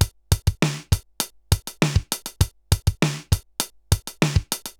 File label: RX17 (100BPM), cyborg beats; musicradar